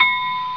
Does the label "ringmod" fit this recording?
No